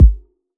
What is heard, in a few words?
Kick Tiny, Drums